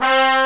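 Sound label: brass section; gmplay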